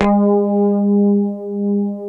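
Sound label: A 3 harmonic, guitar; Spectrasonics; Hans Zimmer Guitars VOL-1; 90's Sample CDs; HYPEMONICS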